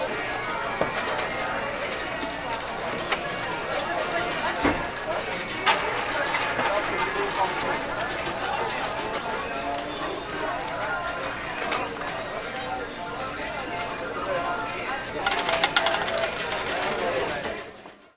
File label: game hall atmo; best service Blue Box